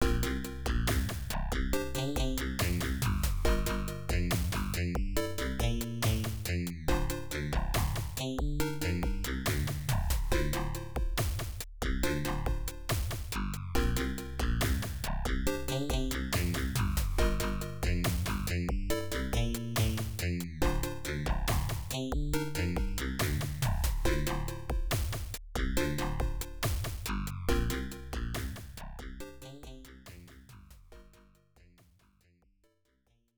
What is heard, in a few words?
Continue; Metal Force 2; FMP Music Disk 3